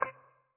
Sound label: beep; Learn to Fly 2